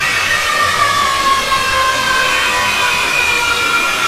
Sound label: AAAAAAAAAAAAA BUT RILLY EXRREME